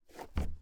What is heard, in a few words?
FOOTSTEP - Metal Board Jump Barefoot Male; Barefoot on Metal; Timothy McHugh; GDC Game Audio Bundle